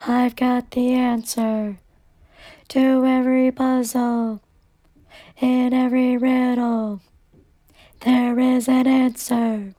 raw vocal seed

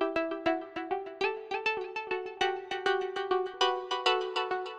synth Liquid Keys 100bpm G

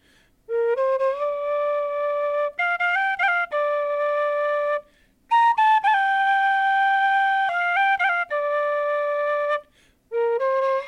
A 100 Irish Whistle

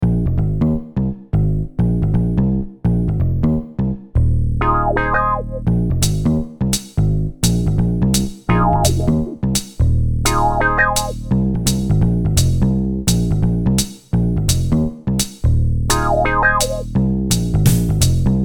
Funky Groove

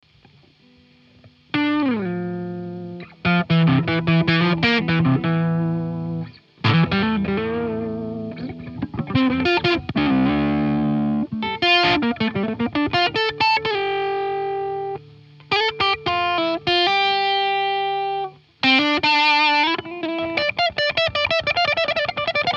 Five-Four Guitar Groove

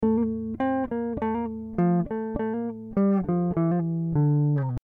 Dm 100 GTR Riffs

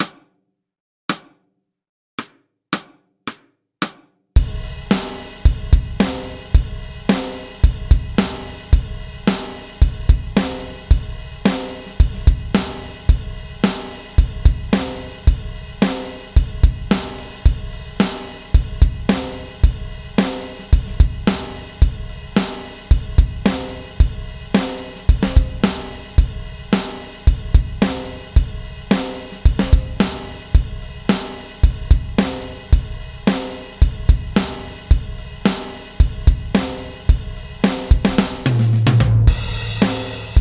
Slide Guitar 110 BPM In G

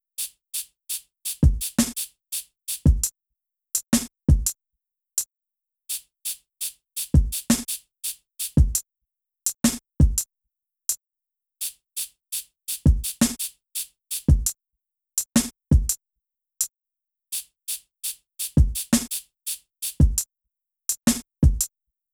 drums

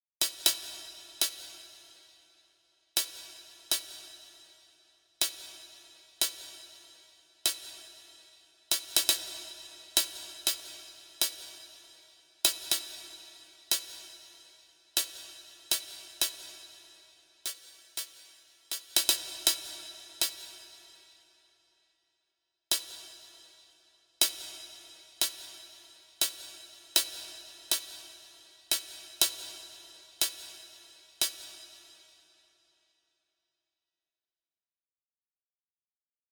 Dark Church

